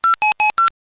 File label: chimer 9 o'clock; linux